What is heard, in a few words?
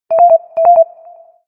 Nfc Payment Fail; Microsoft Lumia-550